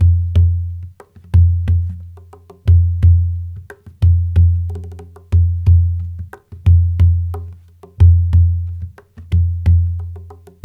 90, African Ethnic Drums; Spectrasonics; Supreme Beats

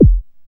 BD ANALOG, Synth; BDs; Sound & Vision - Gigapack II CD 2

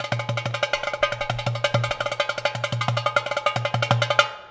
DARBUKKA; Best Service; World Colours; DARBUKKA MLT

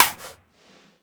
Tool Kit, Drum Machines

